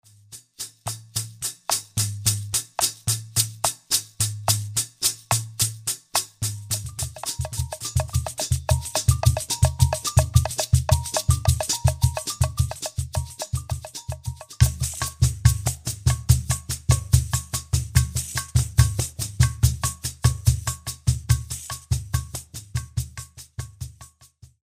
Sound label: Bossa Nova